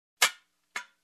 Metal Tool Clank

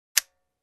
Cocking sound

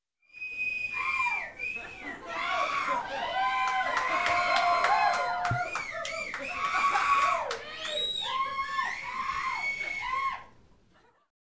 Crowd shouting and clapping

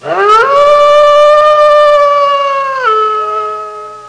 Howling wolf

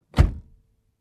Opening Car Compartment

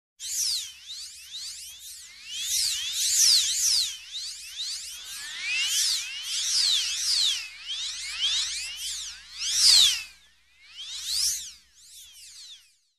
Sweeping through air

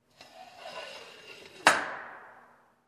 Sliding Door lock click sound

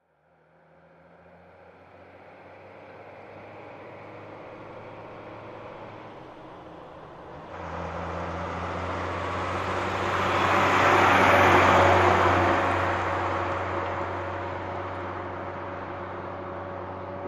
Garbage truck passing by

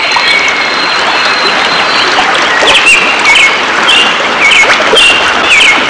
Bird by the river ambience

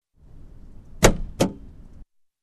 Engine Hood Unlocking

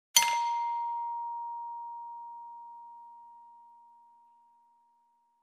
Bell ding sound

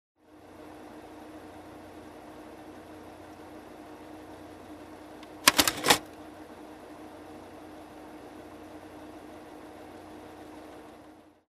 Huge Printing Machine Running

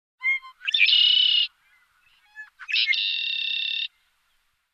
Hatchlings tweeting

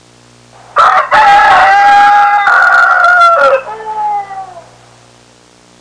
Rooster sound effect

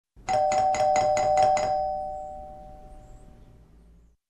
Doorbell

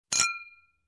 Ice Cubes falling in Drinking Glass

